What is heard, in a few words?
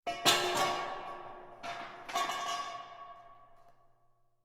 crash steel pipe distant